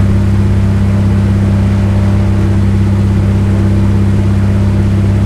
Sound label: zlin cockpit, engines; War Thunder